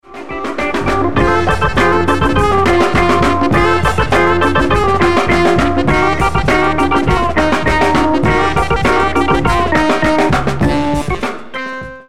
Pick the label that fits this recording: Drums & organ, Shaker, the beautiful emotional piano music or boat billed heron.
Drums & organ